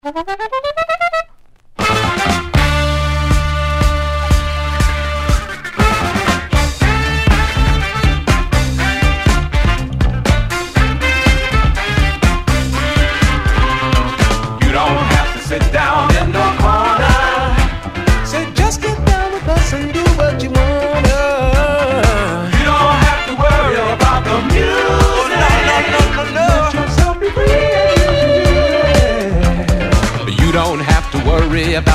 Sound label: Funky Music